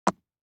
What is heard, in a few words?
DICE on Neoprene, Drop, Standard, 4 Four Dice; Samples; ArticulatedSounds; DICE Sfx Party (Extended)